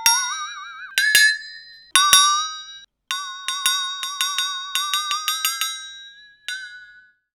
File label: flex tone; Cartoon - film effects